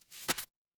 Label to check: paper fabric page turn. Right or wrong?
Right